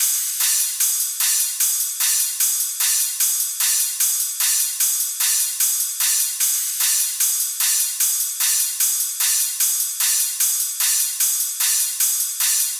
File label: Hihat Loop - 150BPM; Dubstep; Drums; Loops - Top; Raptor